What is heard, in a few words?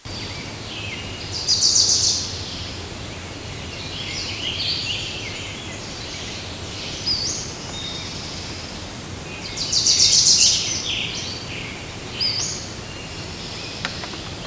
forest ambience loop; svencoop